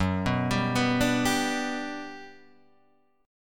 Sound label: G Major F sharp, chord